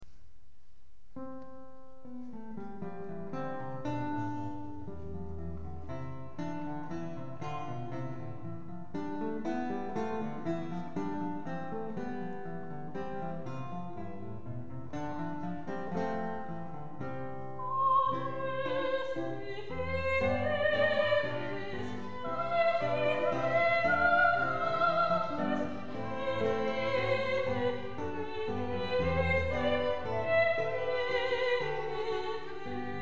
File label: Vanocni tradicional - Adeste fideles